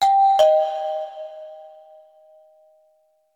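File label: elevator signal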